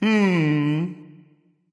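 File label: thinking, nonverbal; smite; voicepack; khepri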